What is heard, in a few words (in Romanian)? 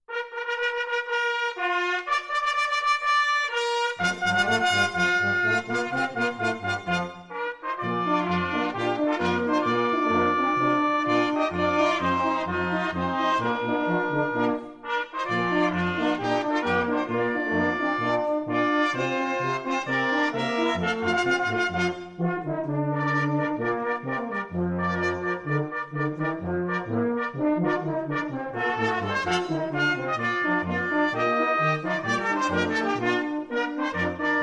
Fanfara